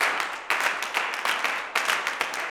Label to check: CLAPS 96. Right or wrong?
Right